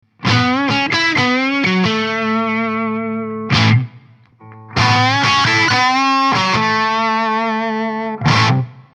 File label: red neck & bridge; Rambler 25W